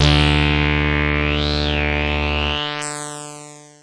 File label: SH101 Tone; SH101 Tones; SYNTH KEYS